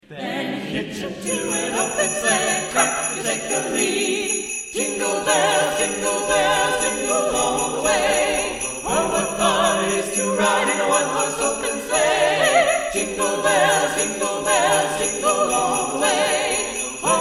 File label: jingle bells